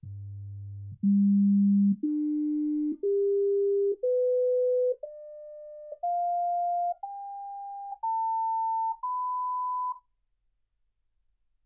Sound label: sinus tones 48kHz